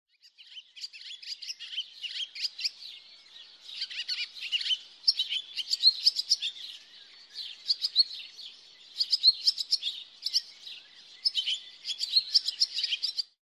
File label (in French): Le doux chant de l hirondelle rustique